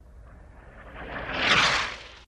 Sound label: Mortar Shell Fly By